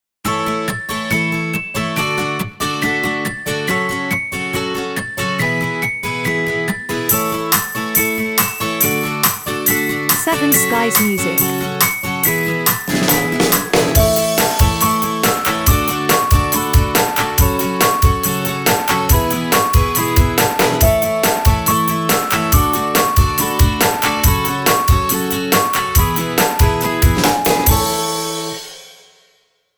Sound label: Cheerful Promo